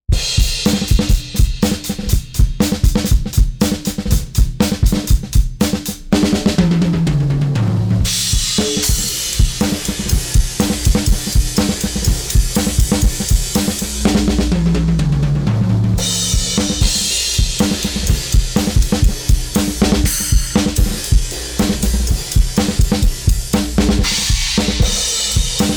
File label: drums; smart comp